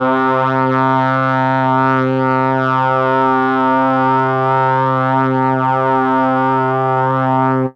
brs c2, Brass, MELLOTRON; Retro Sampler; Brass + Flute

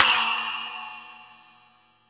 SPLASH; East Collexion; Drum 1 Dry

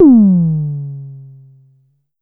Drum X Tom; Pearl Drum-X

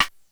Rimshot, Korg DSS-1

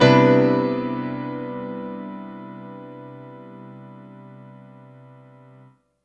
Piano stack (C) maj (jazz); Sonic Foundry (Sony Creative Software); Ugly Remnants VOL-2

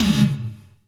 Gated Tom; Univerb; creative gate; Gated Reverb

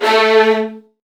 A1, Virtuoso Strings; ILIO; Kirk Hunter Virtuoso Strings VOL-1

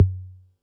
Bassdrum, Pearl SC-40

